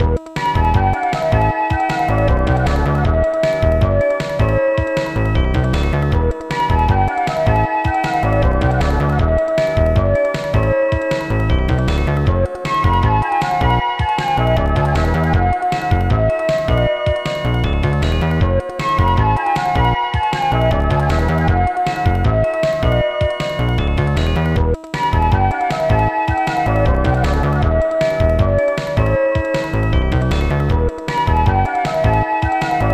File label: Continue; Shin Nippon Pro Wrestling Chou Senshi in Tokyo Dome; SNES